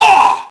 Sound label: mobster get hit; enemy; Max Payne